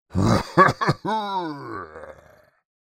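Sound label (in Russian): смех злого тролля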